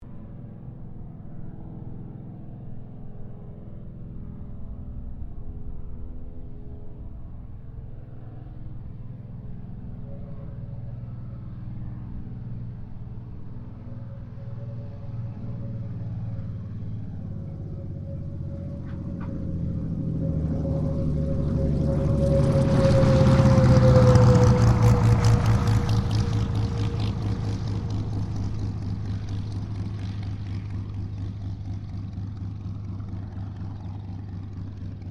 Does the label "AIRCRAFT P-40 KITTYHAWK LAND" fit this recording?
Yes